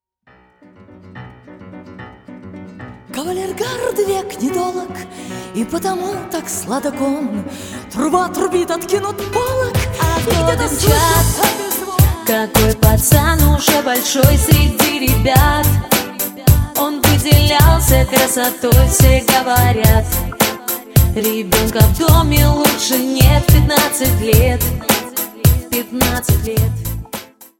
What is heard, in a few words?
music